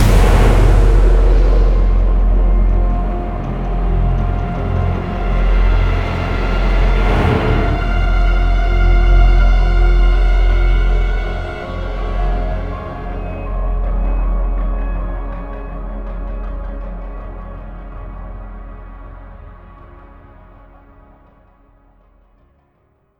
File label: Ambient Sting; Prototype